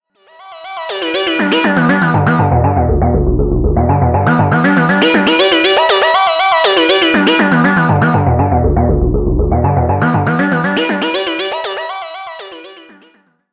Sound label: no res